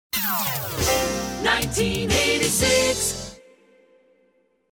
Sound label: Hits, Drops